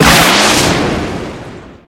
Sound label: superjump; ff2; demonic wizard; liafail